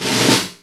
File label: Snare Roll; Groove Elements